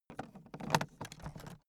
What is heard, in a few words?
aluminium case pick up